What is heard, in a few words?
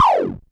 Perc Synth Raw